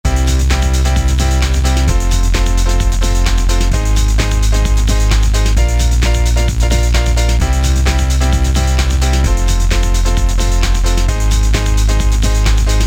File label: DJ Split; Mix; Alesis Rap Techno Dance